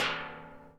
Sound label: ball hit barrel, splats